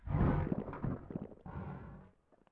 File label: GULP